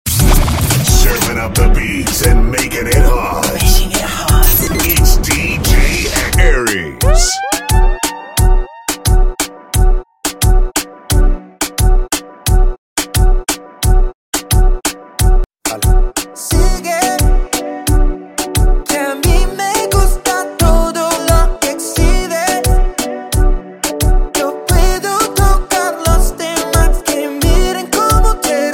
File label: Reggaeton; Explicit